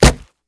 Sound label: wood; imp